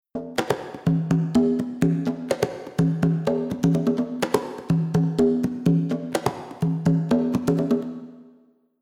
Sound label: Reverb Diffusion High